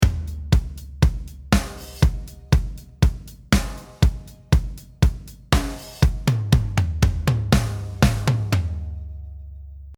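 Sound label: drums bus compressor grit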